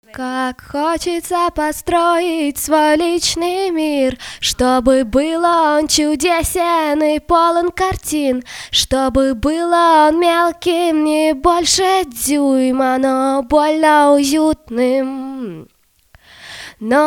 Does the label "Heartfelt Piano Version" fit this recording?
No